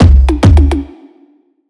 tom smack, Drum One Shots; Slate; SD MIDNIGHT Sample Pack

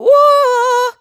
"Woo", adlib, Dry Vocals; Raw Vocals; Slate Digital Omnivox Vocal Sample Pack; Adlibs

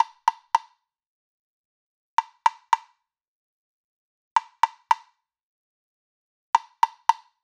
woodblock loop 110

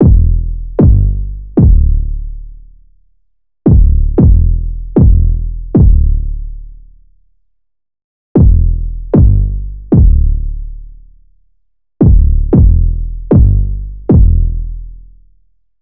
808 loop 135 Em; Slate Digital BASSHOLE Sample Pack

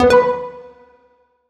tonal oneshot B; Pop; Slate; PASADENA Pop Sample Pack; Tonal One Shots

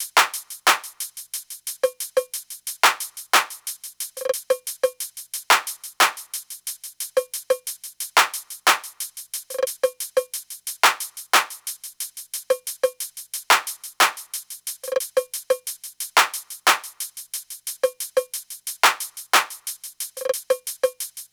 perc 90, Drum Loops; Top Loops; SD MIDNIGHT Sample Pack; Slate

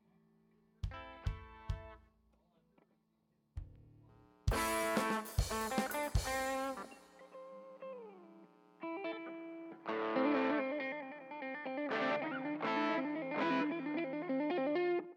Strings Mix

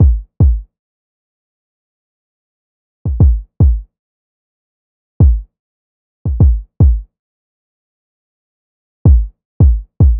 kick 75, Kick Loops; drum sample pack; Cayambe; SEISMIC; Slate

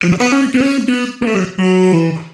phrase F#m, Vocal

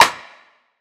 snare clucky; one shots; SEISMIC Drum Sample Pack; Slate

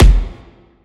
kick oneshot blurred, Pop; Drums; Slate; SD PASADENA Pop Sample Pack

